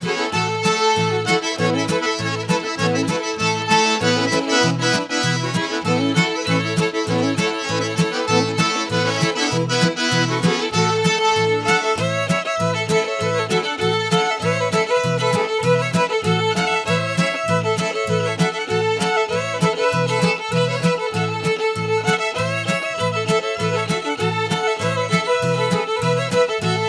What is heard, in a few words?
creole stomp; loc-musicbox